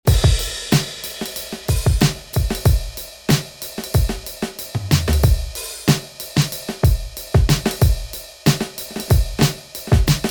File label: Drum Metals round out the beat